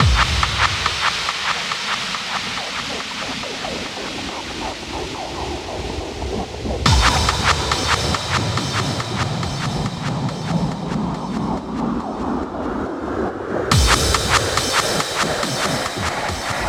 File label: 140BPM FX 16 Bars; Vengeance Samples; Snares FX Pairs